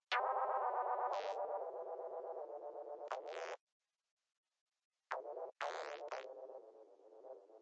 120 voxy hiphop melody; SYNTH AND ELECTRONIC LOOPS

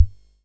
Whippany Rhythm Master bd, drum machine; Whippany Rhythm Master 1970s

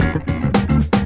114 funky clav groove; KEYS ORGAN PIANO LOOPS

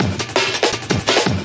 165 tekstep busycomp; 161 to 180 bpm

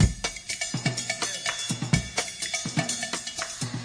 124 bpm cowbeller, drum loops and breaks

